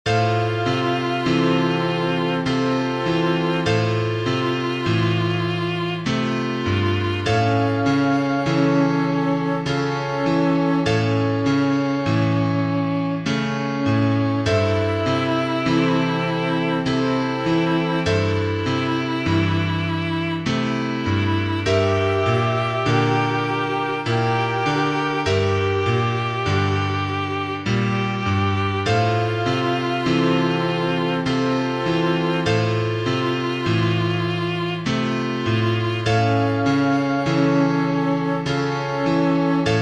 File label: Ballroom Theme Grand Piano Violin Flute